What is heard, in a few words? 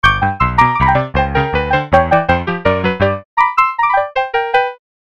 electrikeys yamaha cp70; mell